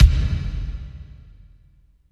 KICK, Tekno Industrial; East West

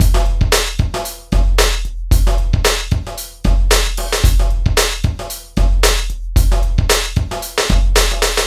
RAPIDO 113, LOOPS

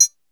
909 BLIP; E-MU Formula 4000 Series Vol. 2

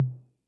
Tom Mid Accent Min Decay, 808

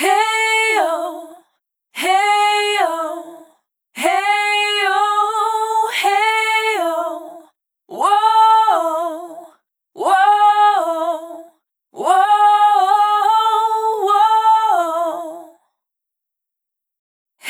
Dry, Multi-Voice Loops, Vocal Samples; Duality A; Sonic Foundry (Sony Creative Software) - Pocket Diva World Class Vocal Samples

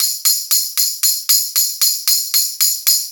6-8 Brass Tamb; Drum Tools; Perc Loops

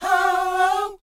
SHOO B G#, R&B Dance, vocal; Spectrasonics Vocal Planet

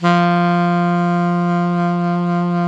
SAX ALTO MP; SAXOPHONES; Roland - LA Composer vol. 1

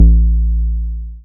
Bass SH-101

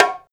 Roland R-8 Bongo Lo, Drums

